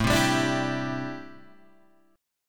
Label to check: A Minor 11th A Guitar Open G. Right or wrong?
Right